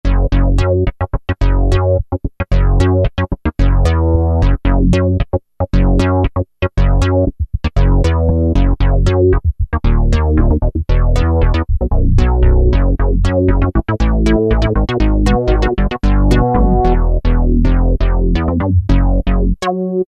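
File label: bass Syn, Yamaha TG500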